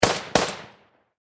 Warfare Machine Gun .303 Caliber Vickers Double Shot Distant Perspective; Machine Gun Sound Effects; SFX Bible 6